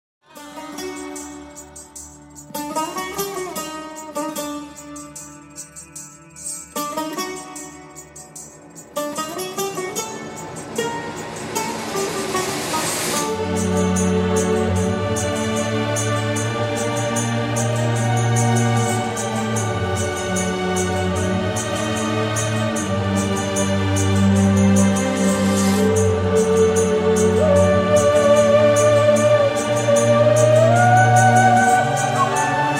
Ambient Theme; unearthed trail of ibn battuta episode 1

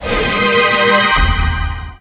Heal Targetet; Knight Online

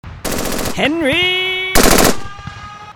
Charge

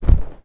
Push; Audiosurf 2

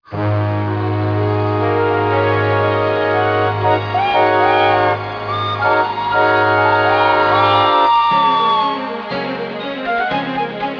train waiting whistles; thomas friends steaming around sodor; 3ds

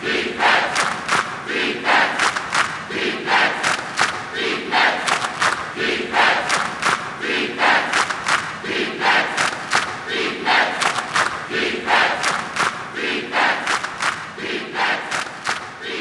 Sfx Basketball

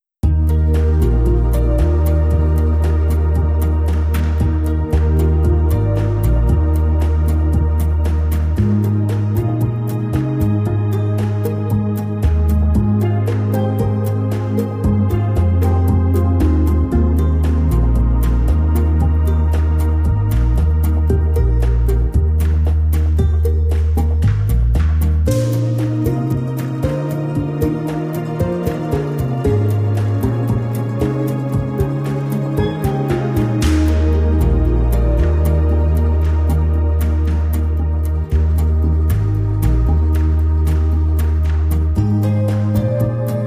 Ocean Park; Buildalot

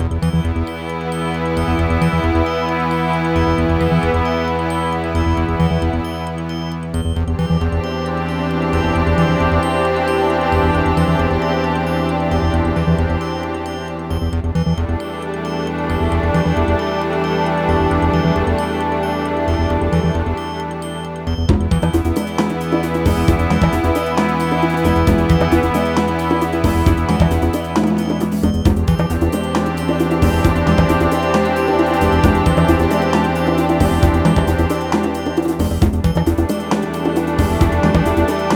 music factory, bpm134; factory04 08; Donkey Kong Country Returns HD

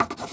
Photo Crayon Env Scatter

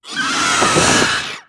Enemy Firebird dead

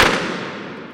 Sfx Menu Boom; Sango 2

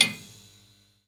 Intro Server Room Light On Blink

